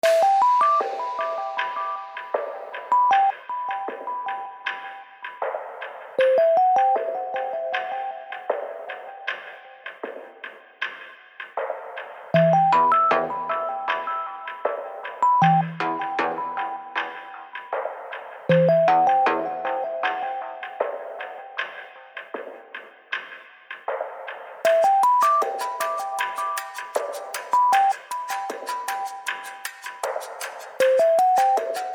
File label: rhythmic; ruhig-majestaetisch